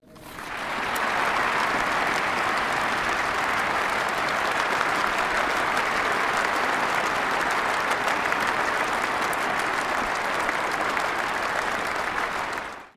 clap; the ratioing

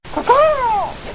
cawcaw

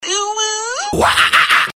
uwu ahahahah

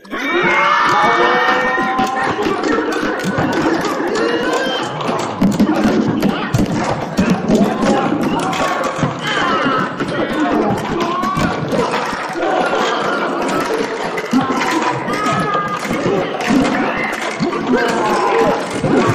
small group battle combat with cries and yells

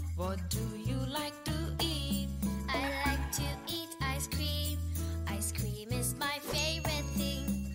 ice sound

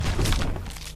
splat; mirrors edge

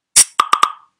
new message sound ui sounds; slack

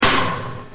clang; half-life

